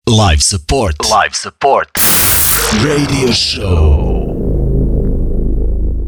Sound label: RadioShow solo vocal